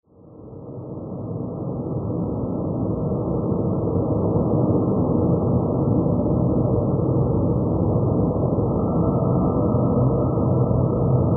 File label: Drones Deep